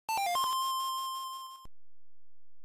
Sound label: healing quiet; project hawk